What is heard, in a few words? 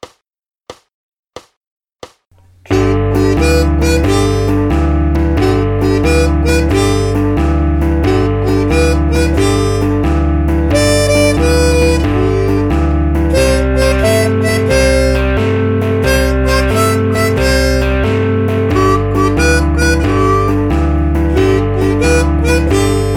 Blues 90bpm